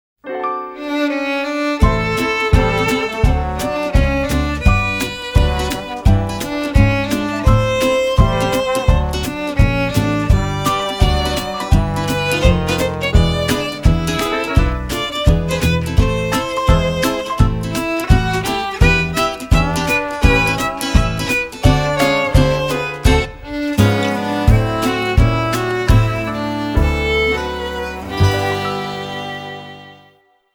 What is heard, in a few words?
old 4 beat, guitar